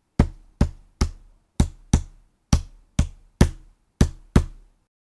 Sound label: 3-2 Clave Example